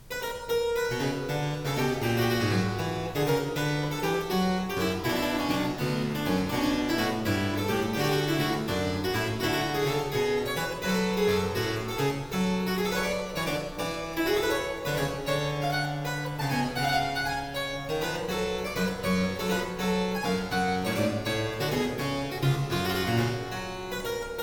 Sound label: gigue; bwv831